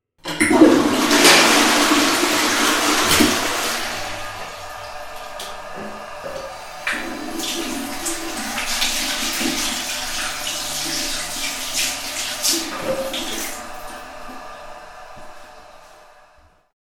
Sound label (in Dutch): thuisgeluid